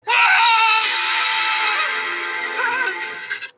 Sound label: puppet screaming; rimini riddle